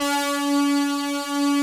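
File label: synth horn C#4, Prophet 5; Greytsounds VOL-1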